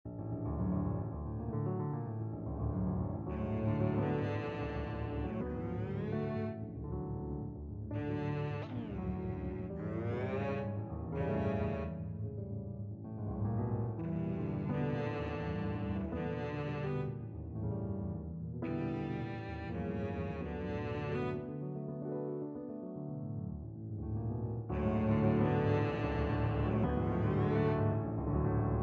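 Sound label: violoncello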